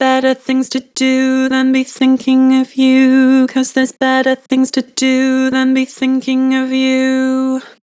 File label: Vibrato, Vocals; Better things to do; Harmor Vocals